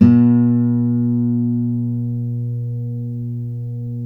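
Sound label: GUILD 12-string, Guitars; Spectrasonics; Hans Zimmer Guitars VOL-1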